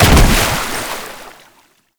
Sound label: Land Heavy Water, movement; Warframe; Uriel